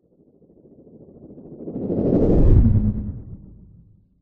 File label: science fiction spacecraft fly by fast